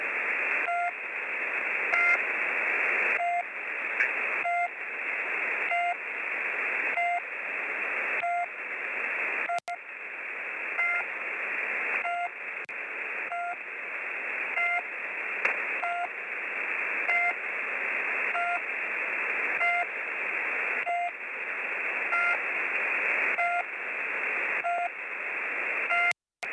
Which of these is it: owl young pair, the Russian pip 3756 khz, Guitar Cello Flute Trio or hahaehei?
the Russian pip 3756 khz